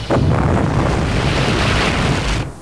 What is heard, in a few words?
explode